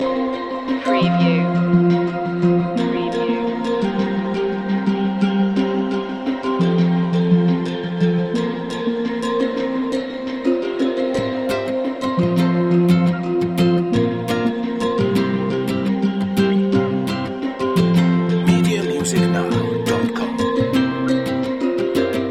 lounge isle loop

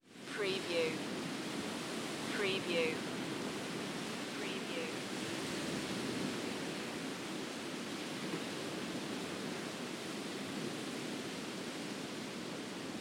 Gentle waves at night

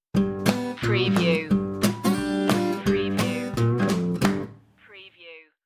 shuffle stinger

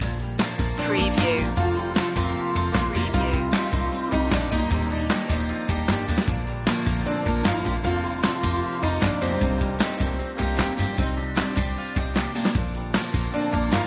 springing indie loop

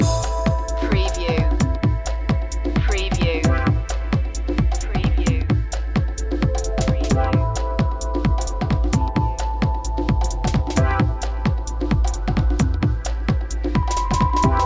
beach life loop

